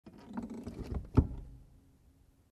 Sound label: Car interior creak